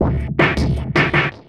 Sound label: drum loop D+B 160; Drum+Bass